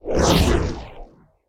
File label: rites, Cult